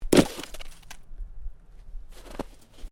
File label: Body Movement Snow Drop